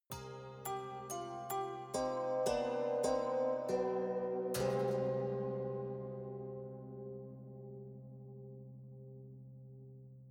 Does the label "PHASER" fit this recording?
No